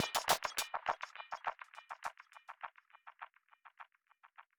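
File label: music endloop percussion; music ftue endloop percus 07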